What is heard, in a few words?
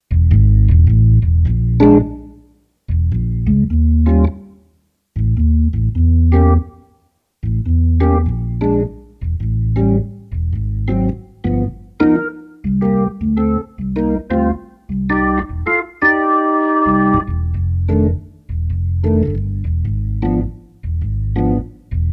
rock organ